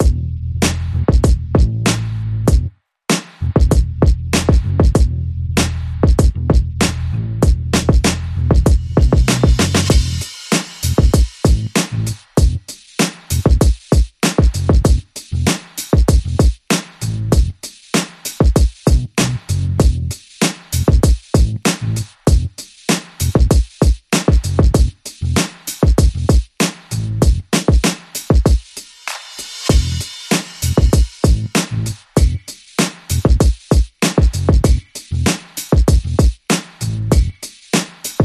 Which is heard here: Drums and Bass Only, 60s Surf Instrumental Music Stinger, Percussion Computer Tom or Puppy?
Drums and Bass Only